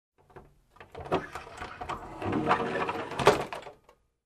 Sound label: Car Door open close